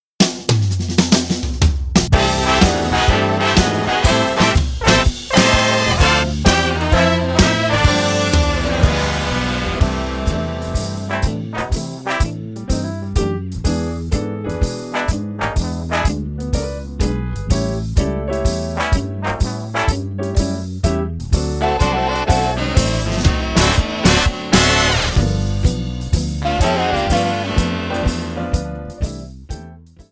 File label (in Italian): slow fox, base